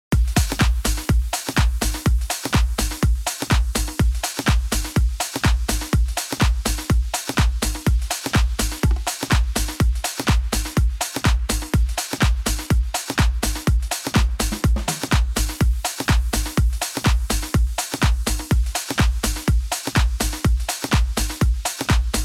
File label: Mix Buss Techno Drums bypassed